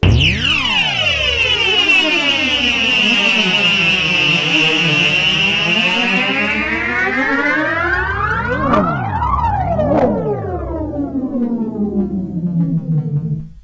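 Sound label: Starship Bump Start, sci-fi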